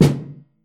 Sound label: metallic snare, notification sounds; zulip